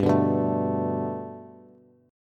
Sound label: G Major Add 9th Bass, chord; Gadd9 strummed; JGuitar